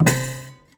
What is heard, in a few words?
Prepared Piano; Battery 3 Sample Kits